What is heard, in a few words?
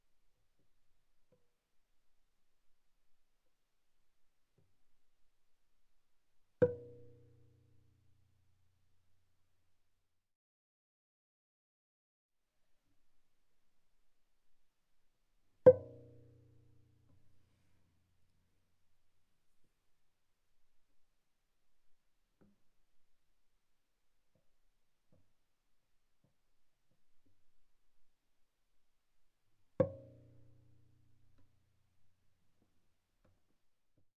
Viola pizz sul D mf C6 D6 stereo